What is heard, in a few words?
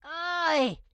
grandma short yell; Blind Drive